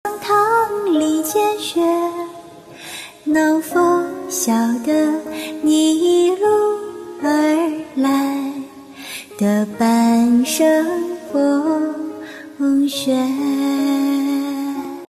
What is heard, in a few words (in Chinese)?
古风歌